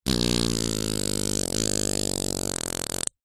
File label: human fart; zapsplat